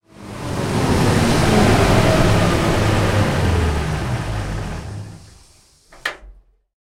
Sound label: panzer3 engine stop, tanks; War Thunder